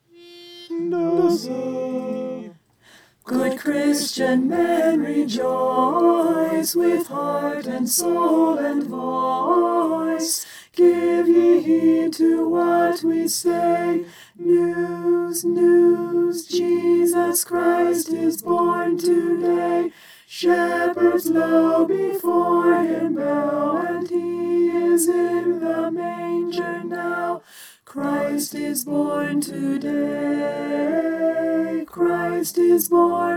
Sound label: alto